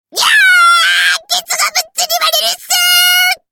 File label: dark hop